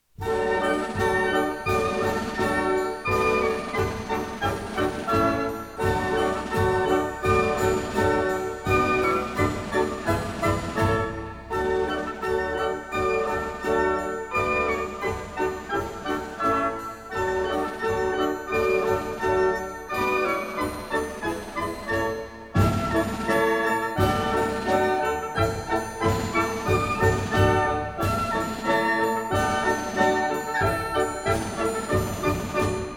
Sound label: Wind Ensemble, March for Military Music in D Major